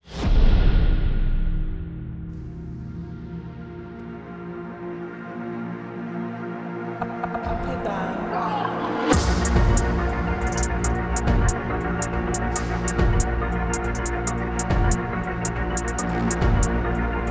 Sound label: BEAT